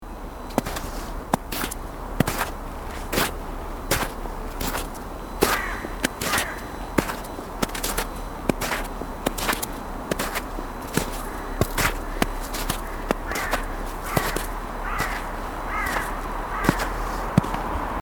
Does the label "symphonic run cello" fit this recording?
No